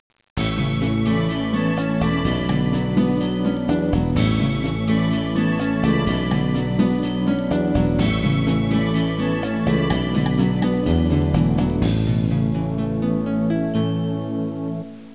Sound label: new age; QChord